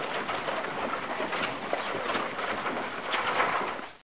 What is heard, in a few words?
house slum; CAESAR3